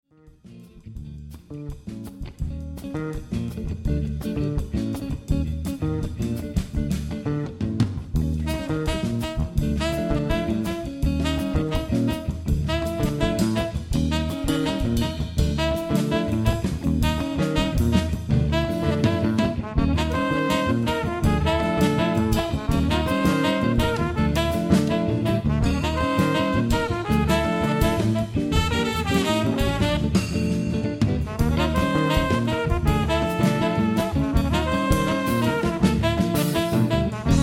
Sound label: Quintet de jazz